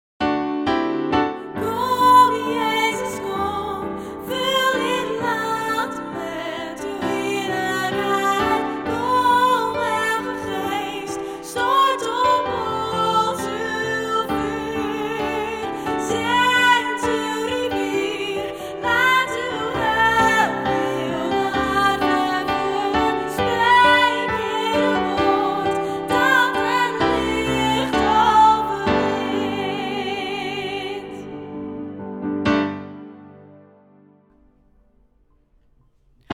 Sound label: Sop, vocale partijen presence choir; Presence op het Malieveld